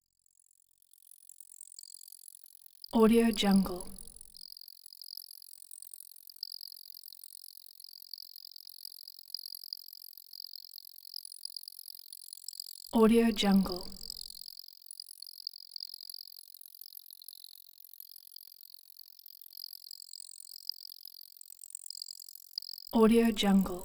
magical wing flutter; prosoundfx

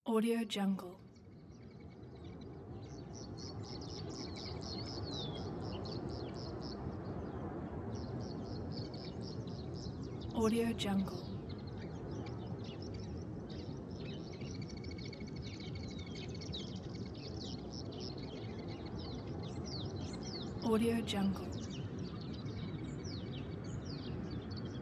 day on nature

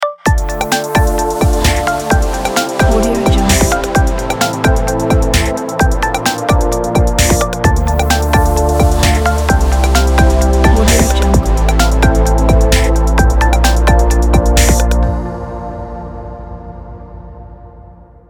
audio logo intro

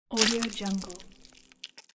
squishing a bug

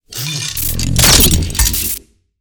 future weapons electro gun minigun reload; future weapons 2; soundmorph

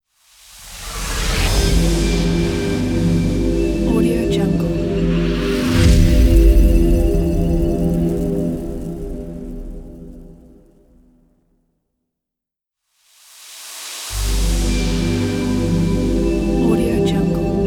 glass logo

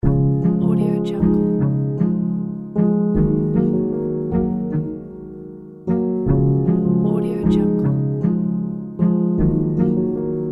pizzicato loop

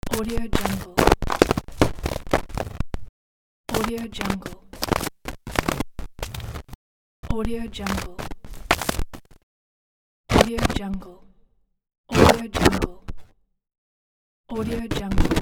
glitch fx pack

